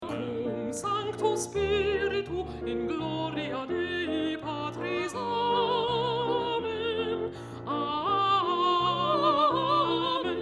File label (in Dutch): Tenor, Cum Sanctu Spiritu; Rossini; oefen CD; Onversterkt